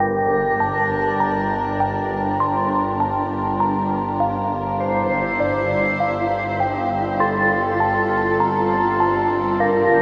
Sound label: Main Music; Eon Altar